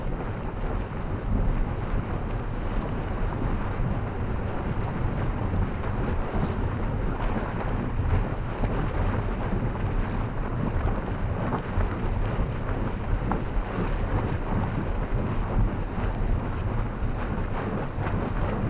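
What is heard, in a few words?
Water Bubble Water Boil; water sounds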